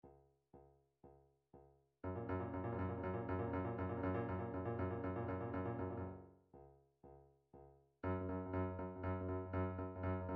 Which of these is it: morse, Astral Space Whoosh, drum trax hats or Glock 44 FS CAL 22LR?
drum trax hats